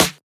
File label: Snare, Dubstep One-Shot Drums